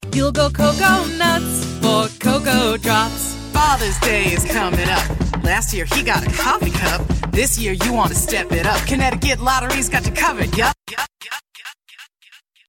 jingle singer rap rapper spoken word advertisement